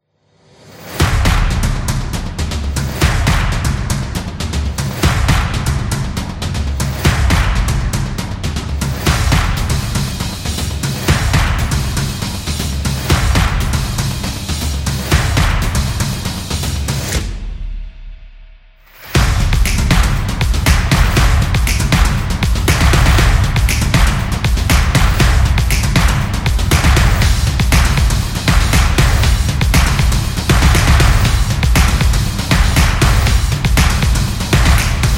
Text Promo

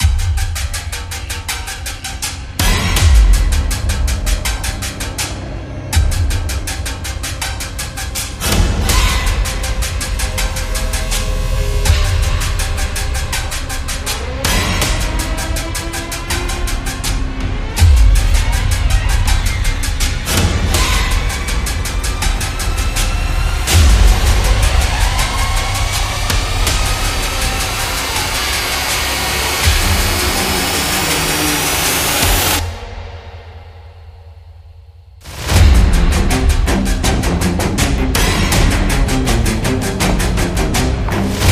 Slasher

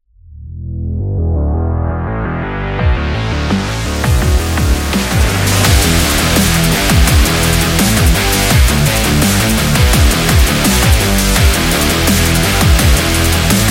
The Dubstep Rock

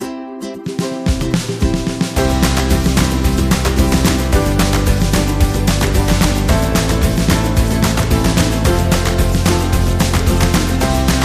Happy Upbeat

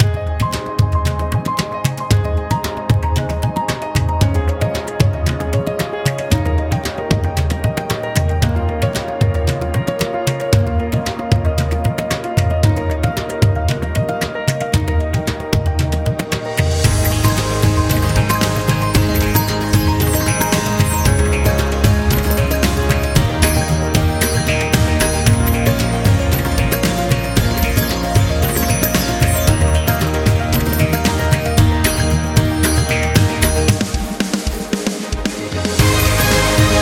Upbeat Beautiful India Adventure

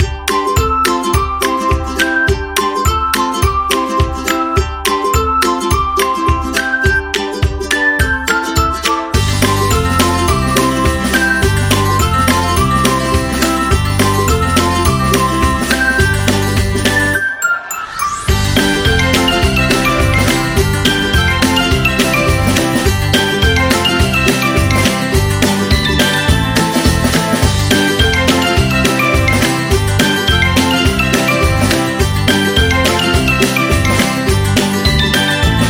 Background Happy

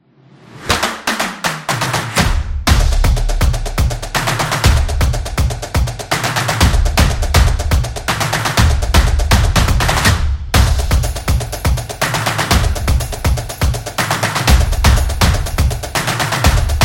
A Drumming Solo Beat, samba

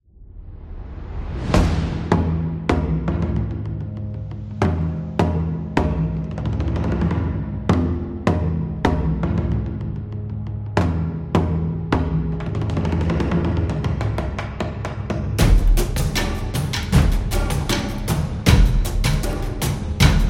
Zulu

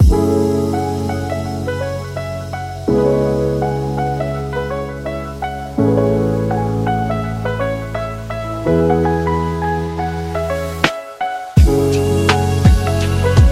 Upbeat Lofi

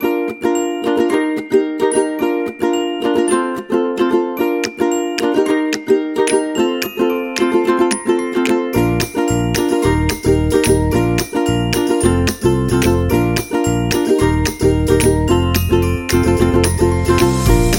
Funny

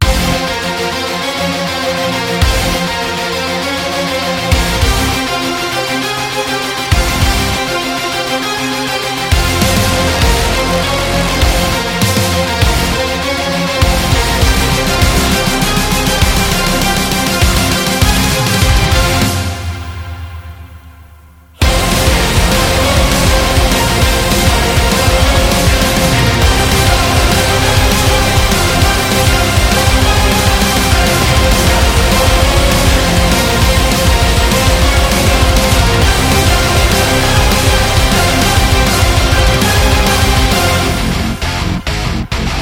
Epic Metal, Powerful epic background music

